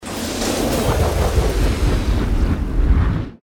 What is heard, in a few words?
slots scatter roll